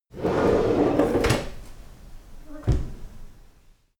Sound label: closing drawer